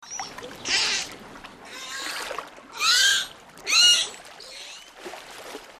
sonido delfin; mekanta

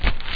pisada conejo; primartis; sonidos

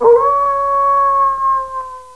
lobo largo; primartis; sonidos

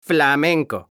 flamenco; mekanta

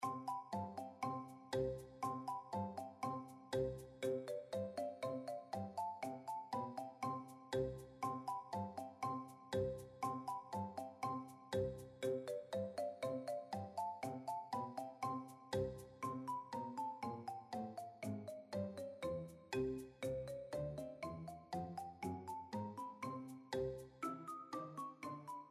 musica premio; mekanta

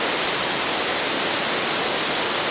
cascada largo; primartis; sonidos

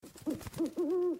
aleteo; mekanta; jungla

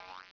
pisada conejo boing; primartis; sonidos

